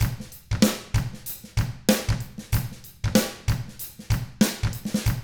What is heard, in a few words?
95 FUNK, Drums; ILIO; Double Platinum Drums 1